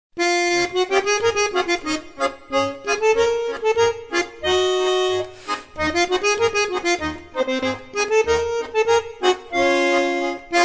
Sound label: banjo